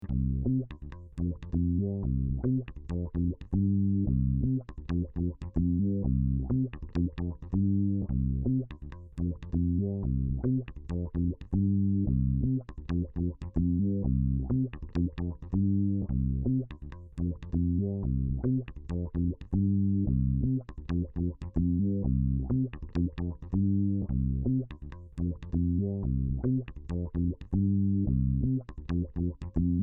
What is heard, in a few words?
Autowah Electric Bass, 24 db oct; mutator demos